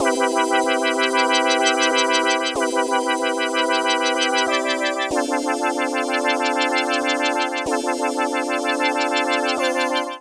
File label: C Major 94 bpm; Accompaniment; Tonal; MIDI Clips; Oscar Stollig; Drive and Glow; Ableton; Factory Packs